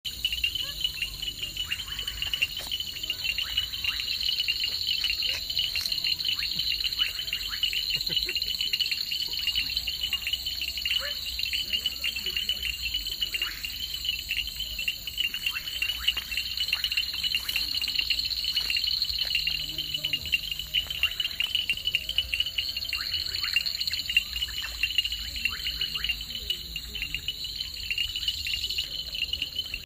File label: frog Sing